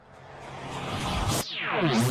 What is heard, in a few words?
gaster blaster reverse; undertale